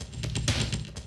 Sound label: 125, Classic Drum Loops; Best Service; XXL 1500 Classic DrumLoops; Sample CDs